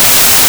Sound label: Noise